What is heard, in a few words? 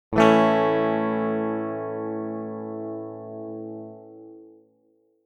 Electric guitar A major single hard strum